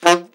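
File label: ALT STK S, alto sax D3; Best Service; Alpine Volksmusik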